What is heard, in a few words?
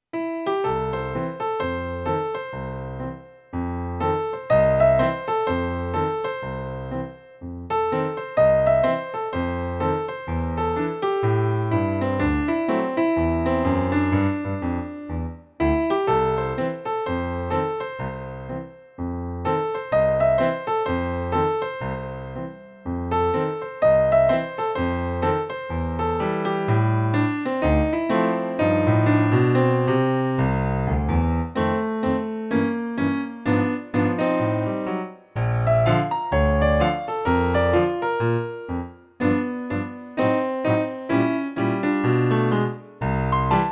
Piano; Steaming Down the Mississippi